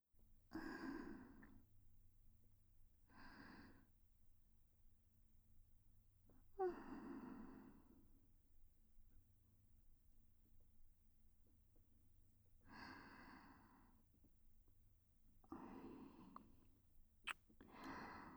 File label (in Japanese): 肉布団ベロチュー添い寝ループトラック, ASMR; クールな奴隷エルフ姫とのビジネスエッチ→ラブ堕ち性活2～二人きりの新婚旅行であまあま天国♪